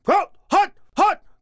Enemy Generic Human Spawn Army

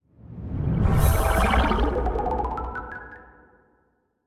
Rebuilding

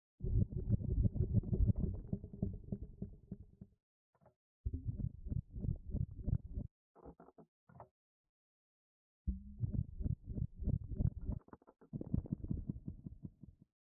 Purple Rumble

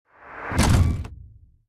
Guard; Makobo

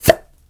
Arrowstorm

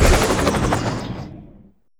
Drone

